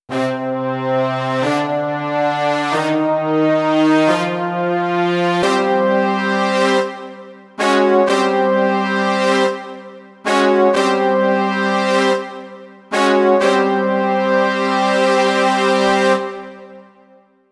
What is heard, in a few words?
brass